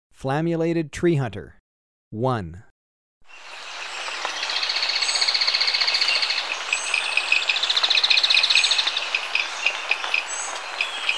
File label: Flammulated Treehunter, Birds of Ecuador; S. America